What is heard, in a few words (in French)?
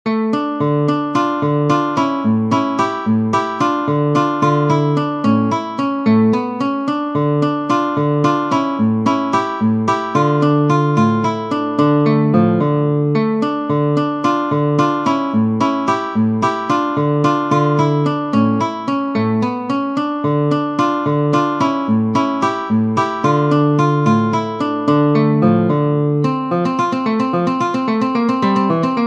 Allegretto, guitare; Ferdinando Carulli; Op. 241 Méthode pour la guitare